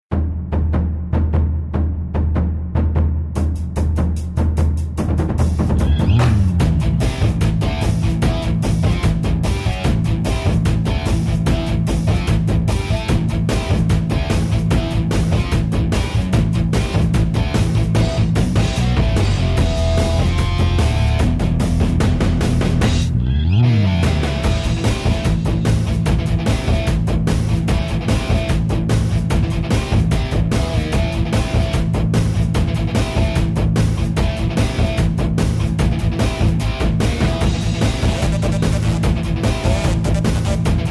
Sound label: music forest boss; iDaggers; Android